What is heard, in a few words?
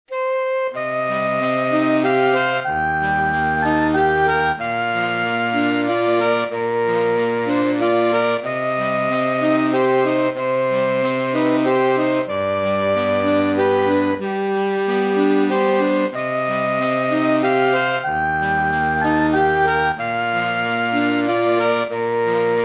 greensleeves sax